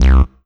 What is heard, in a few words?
Yamaha FS1r Acid King C2